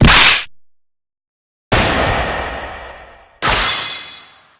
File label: rebuttal